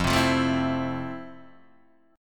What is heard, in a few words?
F Major 7th F; FM7 Chord {1 3 3 2 1 0} strummed